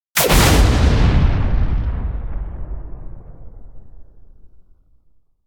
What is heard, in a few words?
Explosion Sci-Fi Pipe Explosion; Hollywood Edge; sound designer's toolkit v2